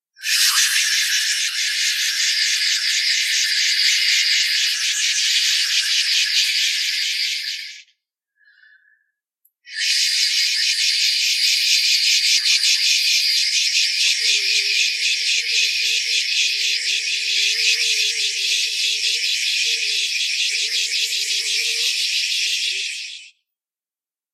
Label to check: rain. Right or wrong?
Right